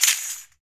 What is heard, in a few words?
RAKATA, Afro Shakers, Ankle Pods